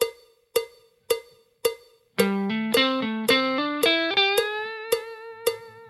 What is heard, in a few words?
blues tricks full, guitar